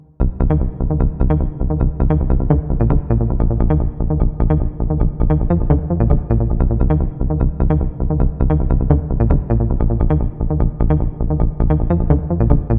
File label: Bass; Bass-Your-Ass; acid trance techno